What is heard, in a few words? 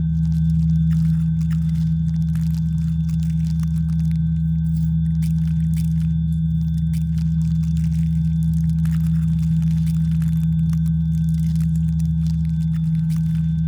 pro ship science idle; biogenesis